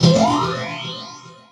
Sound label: Pickup Magic; SFX Kit; Pickups